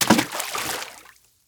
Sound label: water movement splash